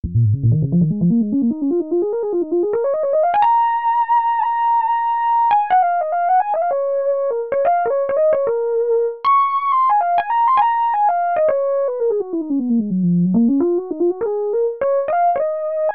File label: The Lead I Use Most